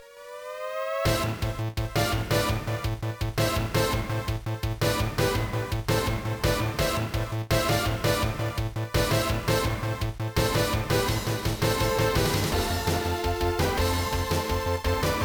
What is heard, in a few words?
Car Settings; F-Zero GP Legend; Alternate